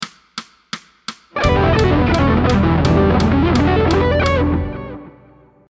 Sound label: Lick